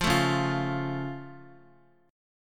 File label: Db Augmented Db down Guitar; strummed; JGuitar